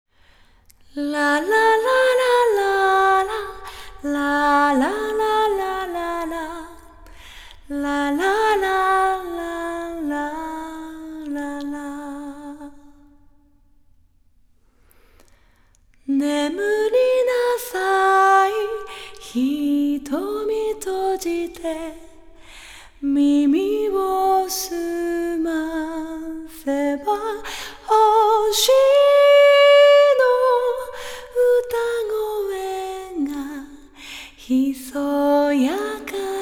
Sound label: Lullaby vocal solo; hoshiuta